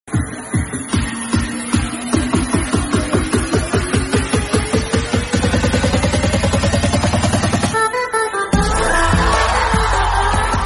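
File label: background music instrumental; surviva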